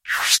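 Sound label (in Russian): резкий звук выпуска пара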